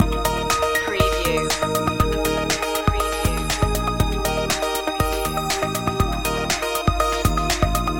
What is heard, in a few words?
radio waves loop